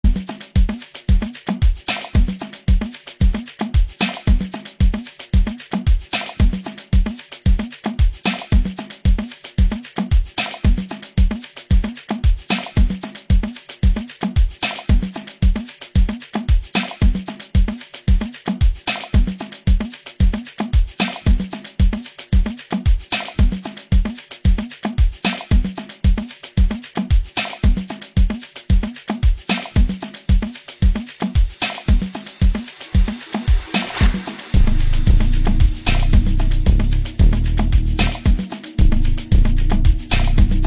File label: Ambience